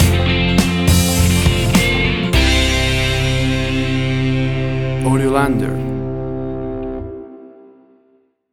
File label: Blues Riffing Stinger; Cool riffing; AUDIOLANDER